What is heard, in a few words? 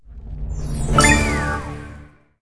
candy Win Reveal High; fairyhansel